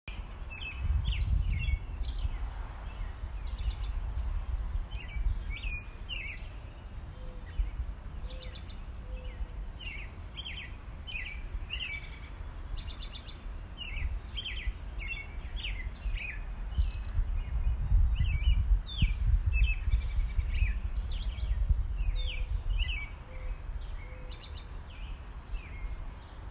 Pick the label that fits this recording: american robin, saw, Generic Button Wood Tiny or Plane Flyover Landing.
american robin